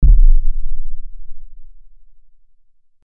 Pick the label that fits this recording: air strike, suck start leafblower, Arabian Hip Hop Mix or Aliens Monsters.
Aliens Monsters